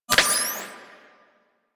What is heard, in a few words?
Puzzle Symbol Switch UI; Venus Kahl; Warframe; Quests; Veilbreaker